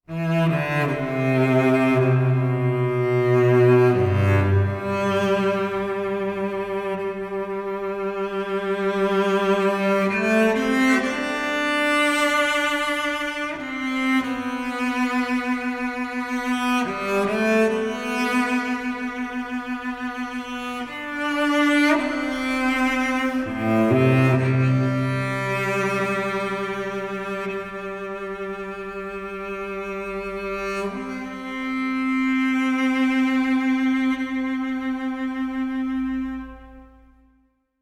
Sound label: cello solo; CODE-E